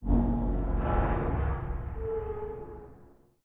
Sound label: Ship Arrive At Planet